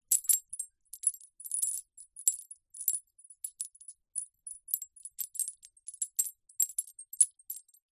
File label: windchime small metal delicate; household; zapsplat